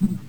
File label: land; madcars2; radicalplay